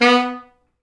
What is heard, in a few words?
B2, Pop Brass; Best Service ProSamples vol.19; AKAI